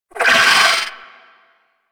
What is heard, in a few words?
Amalgam Moa Satyr Vocal Pain; Warframe